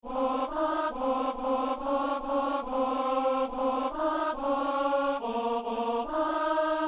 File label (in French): psaume alto; Psaume 95 (96); Messe du 17 janvier à Saint-Denis